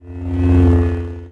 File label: lightsaber swing slow; weapons